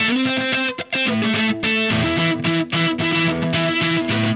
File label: Guitar, Indie Rock; Sonic Foundry (Sony Creative Software); Indie Rock Anthems; Session 01 - Brighton